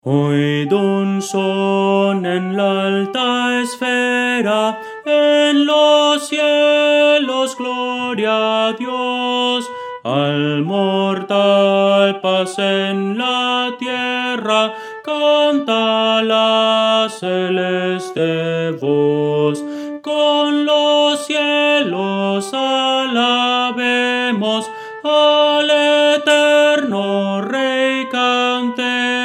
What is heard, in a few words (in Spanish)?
oid un son en la alta esfera soprano